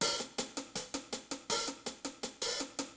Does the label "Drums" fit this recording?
Yes